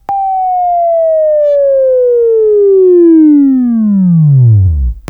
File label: Buzz; Labview-Program; Sweeping Training program